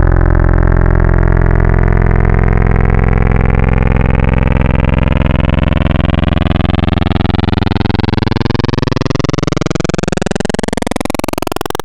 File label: Analog PWM Custom, wavetable